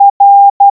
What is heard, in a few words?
oubun r, morse